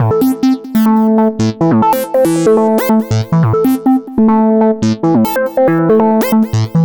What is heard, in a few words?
Synth Soul